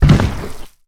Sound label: foot